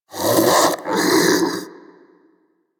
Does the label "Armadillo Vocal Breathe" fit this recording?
Yes